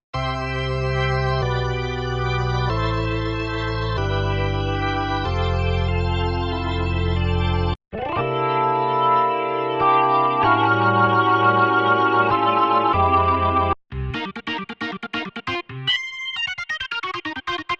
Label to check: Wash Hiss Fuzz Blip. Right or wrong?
Wrong